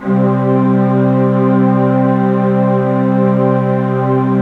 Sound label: 3D Pipes Synth; E-MU Producer Series Vol. 5 – 3-D Audio Collection; 3DSynths